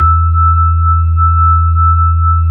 B-3, Hollow; Keyboards of The 60's and 70's

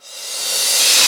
Reverse Cym, Cymbals

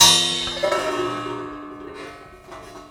Prepared Drums

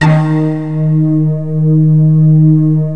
NEON D#5; M1+D50

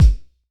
Punch Unch, Kicks; Airwindows

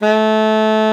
SLID A3, ALTOSAX; Xsample VOL-2

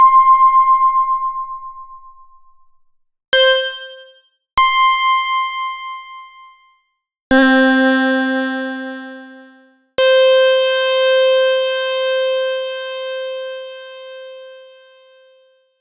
PPG Wave 2 2 Leads and Keys

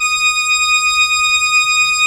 D#6, CHURCH OR; Best Service; Advanced Media Trax Modern Composer

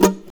NOISE, guitar; Spectrasonics; Hans Zimmer Guitars VOL-2

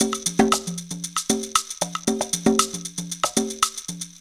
PERC LP, PERC LOOPS; Zero-G; Funky Elements

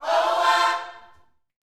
Vocal, Gospel, PHRASE; Spectrasonics

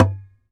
SAZ TAP; Spectrasonics; Hans Zimmer Guitars VOL-2

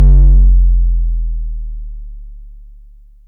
boom, Korg Electribe ER-1; Kit 17

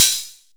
Bass Closed Hat, Jomox Airbase99, Drum Machines

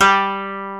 KOTO G2; World Combo

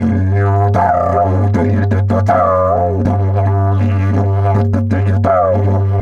Didge 80 BPM, Didgeridoo

